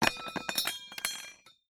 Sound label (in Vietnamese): Tieng dung cu bang sat roi xuong san